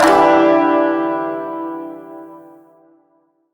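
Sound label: Shawzin Major Chord E; Chords; Warframe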